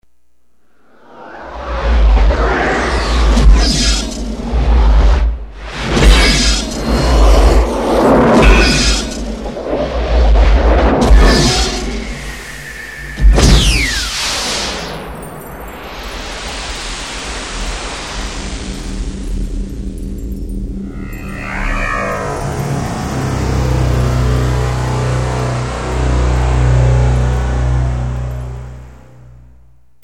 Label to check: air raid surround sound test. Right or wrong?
Right